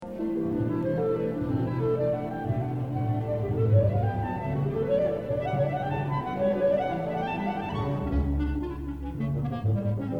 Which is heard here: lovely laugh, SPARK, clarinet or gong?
clarinet